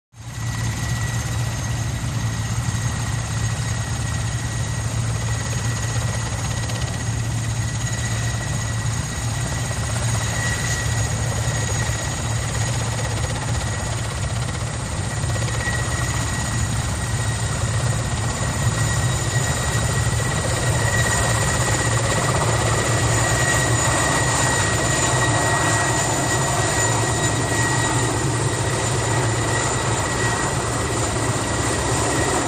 Helicopter Hover

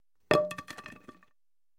Baseball Bat Wood Dr; baseball fx & games

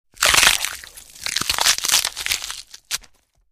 Bone Breaks Stab Wet, punches kicks hits bones

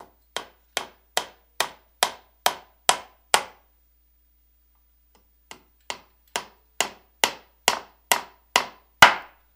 Hammering Nail Wood; hammers

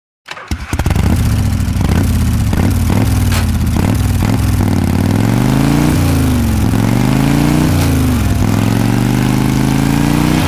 Constant Harley Davi; harley-davidson

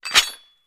Blade Chings Fast Met; swords

